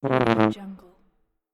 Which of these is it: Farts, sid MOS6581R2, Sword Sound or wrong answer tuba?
wrong answer tuba